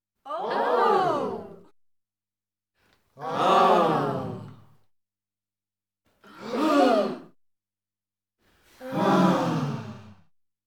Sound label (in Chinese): 众人(成人)喘气、叹气、放松